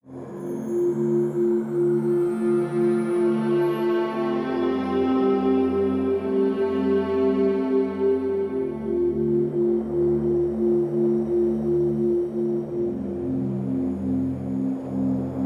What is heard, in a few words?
Relaxed Focus